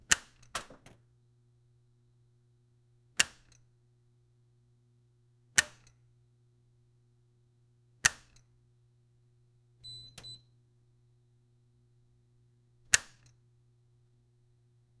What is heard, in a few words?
fluorescent lighting, buzz